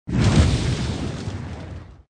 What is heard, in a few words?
Ball Shot